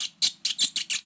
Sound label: Transformation